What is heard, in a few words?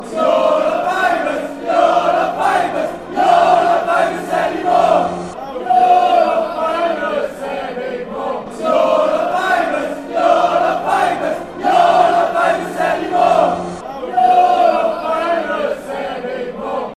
your not famous fanchants